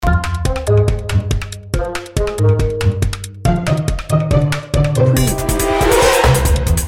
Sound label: busy pluckers loop